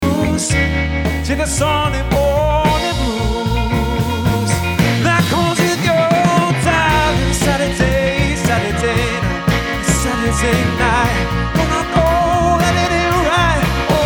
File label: Sunday Morning Blues